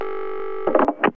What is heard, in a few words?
Phoneline Pickup